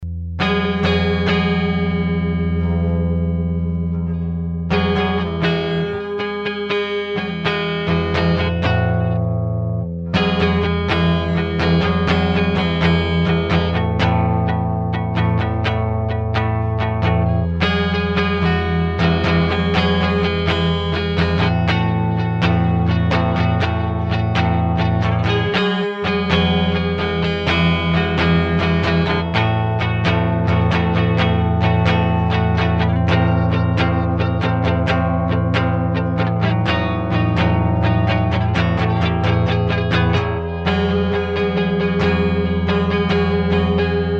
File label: poprock